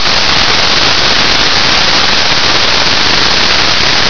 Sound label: applause; LibreOffice